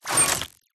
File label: Objects Items Interactions Menus; PMSFX